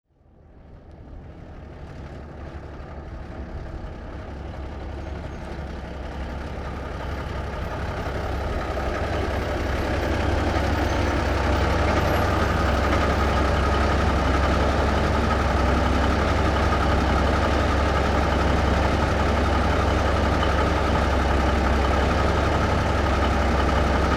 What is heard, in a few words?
Big Rig Ext In Idle Off, Period Vehicles